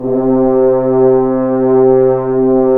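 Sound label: french horns, swells; Roland L-CDX-03 Disk 2; F.Horns 1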